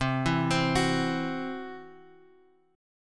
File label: C-Major Flat 5th, guitar; CMb5 Chord {X 3 2 X 1 2} arpeggiated